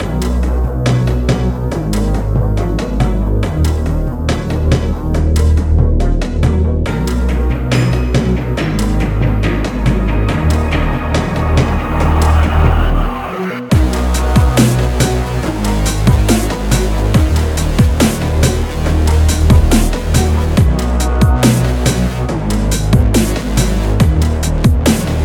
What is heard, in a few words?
Fight Wut New Version Added Guit